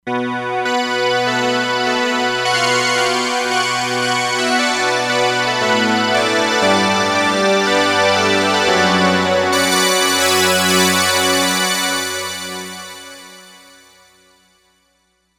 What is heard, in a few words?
Eurostructur, E-mu Proteus-3; Factory patches